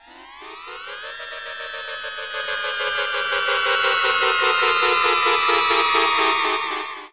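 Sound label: SYN left, Production Effects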